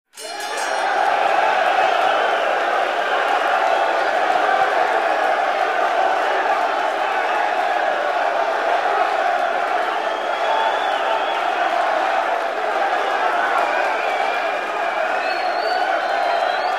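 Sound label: boxing sounds, gym atmosphere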